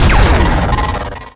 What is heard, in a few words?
fire; skbt astro duchess